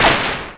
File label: impact; godot